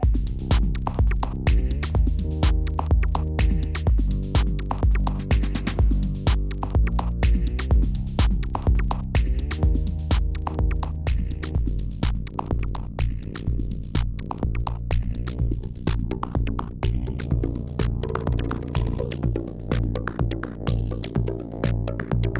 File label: Robot